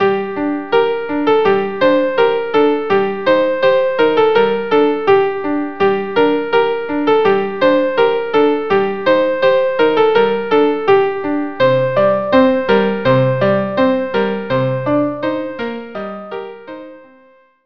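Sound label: viola viola; Anah Halach Dodeich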